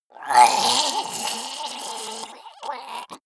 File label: monster die gurgling high; kreaturen